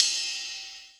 RIDE; 90 s Sample CDs; Best Service Dance Mega Drums

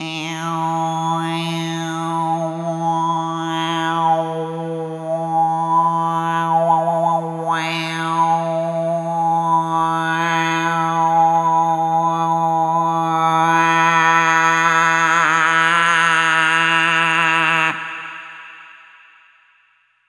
Arrhythmic E; Sonic Foundry (Sony Creative Software)

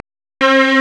STRNG; CMP - Analogon

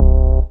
DM ORG1, Dance Mega Synth

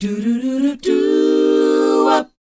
DOWOP F; ILIO; Vocal Planet

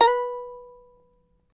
charango B3, guitars; Spectrasonics; Hans Zimmer Guitars VOL-2

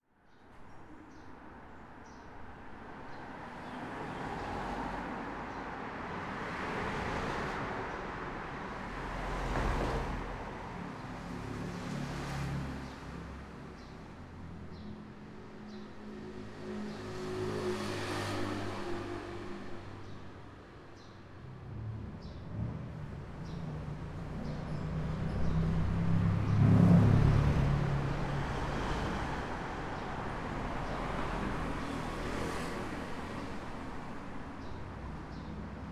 Traffic Pass By, Birds; City Sounds

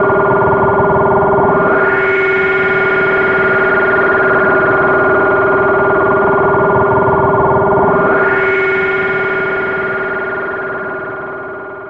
syn, Chillout Synth Atmos; Best Service ProSamples vol.36 - Chillout

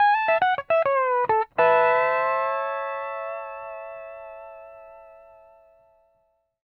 R, Whole Lotta Country, ENDING105

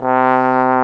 BRS BONE, Trombone; Roland L-CDX-03 Disk 2

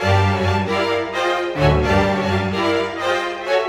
Strings; Sonic Foundry (Sony Creative Software); Orchestral Series VOL-4; Rock and Pop; Rock-Pop 16

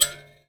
Finger Hit, Prepared Kit; Battery 3 Sample Kits

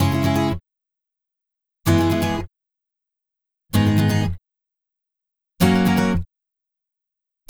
Acoustic Latin Guitar 128BPM C#min; Vengeance - Essential Clubsounds Vol.4; Guitarloops

